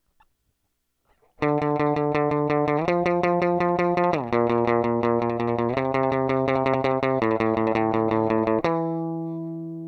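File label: guitar distortion; gtr dist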